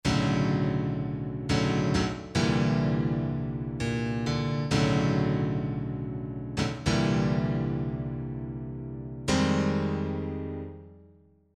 piano, pure plastic, Yamaha TG500

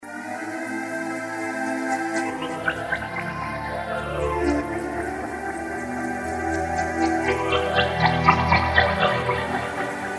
pad slided, synth roland jd800

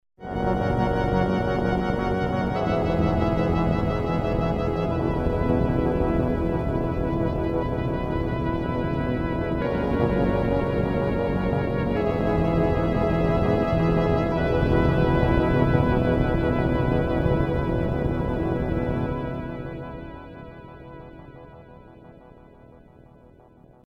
multi, kawai k1r

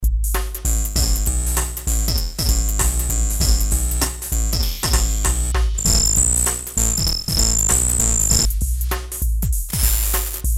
bend, Circuit bending, Emu Planet Phatt; a Motorola MC 68000 CPU (mc68hc00fn10) system handles up to 32 voices of polyphony

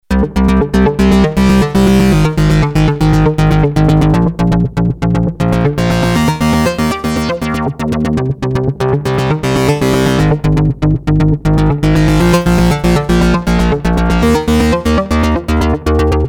synth modern; Creamware minimax

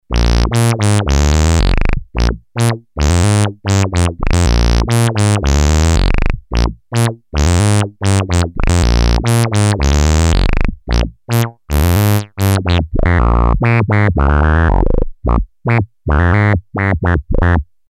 bassy, Novation Bass Station II; bass wet; analog monophonic machine with subtractive synthesis

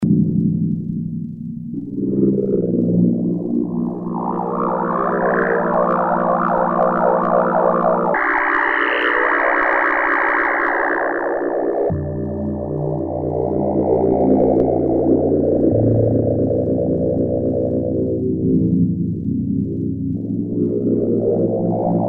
ambient system, synth Roland alpha juno

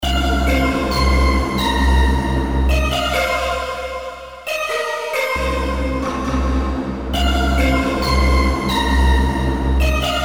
synth; Emu XL1 Xtreme Lead; fairlight

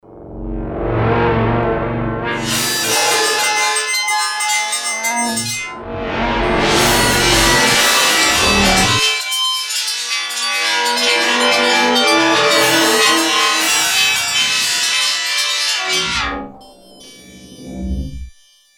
fx fm metal, yamaha sy99 synth

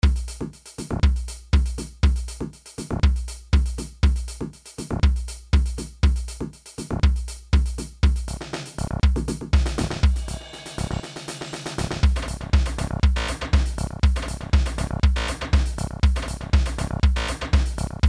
Yamaha RX7 mix

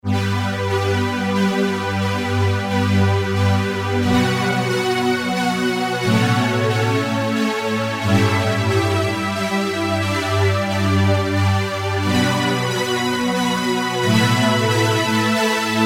string; Emu XL1 Xtreme Lead

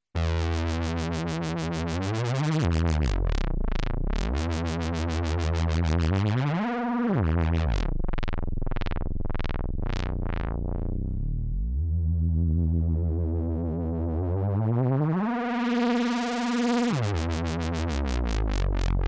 wob, Roland dj70

